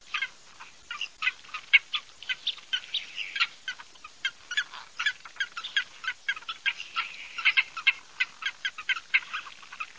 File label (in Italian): moretta tabaccata, birdsound